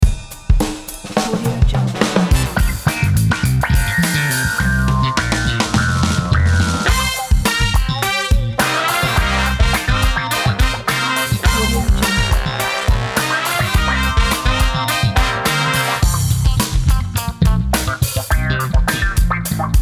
opener funky

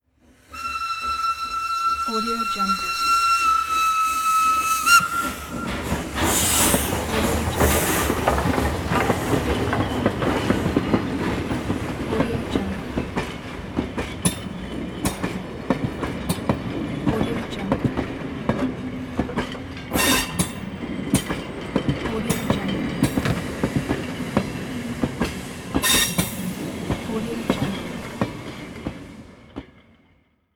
steam locomotive train whistle and pass by

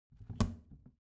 object plastic trash can open heavy

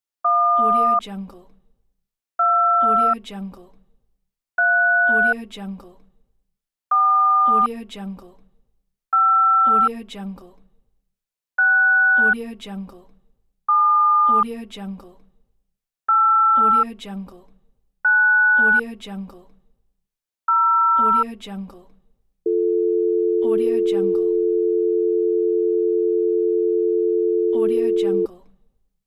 phone dial; moonaudioproduction

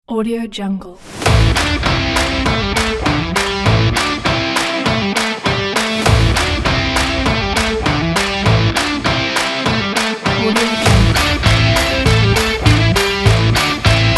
upbeat energetic rock; soundride

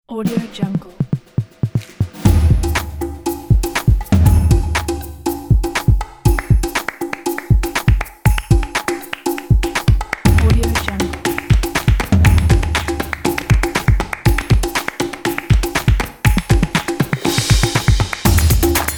happy latin breakbeat

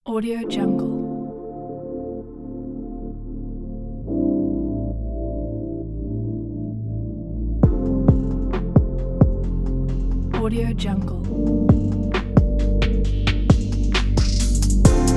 atmospheric chill abstract background

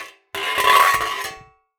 spring roll down jagged sheet metal; esmteam

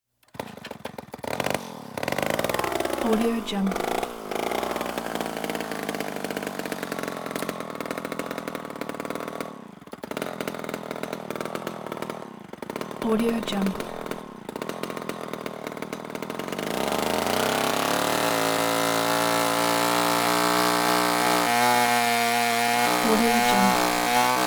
chainsaw pull starter run revs buzzy grind; prosoundfx

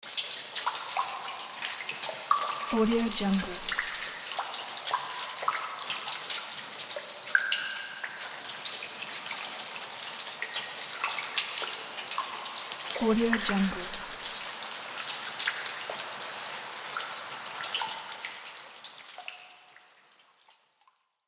cave ambience water dripping

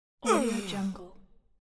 male groan pain

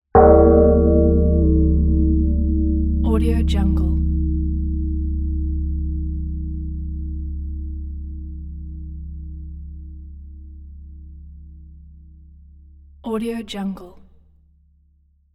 bell ring impact clunking muted hit crashing

